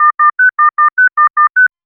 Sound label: an alert sound for astron